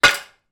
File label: household free standing bathroom mirror impact